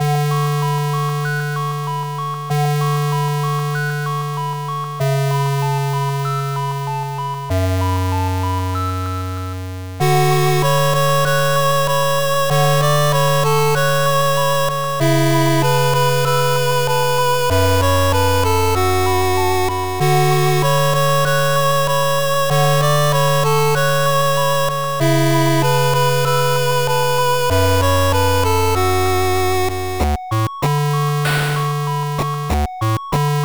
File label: 8bit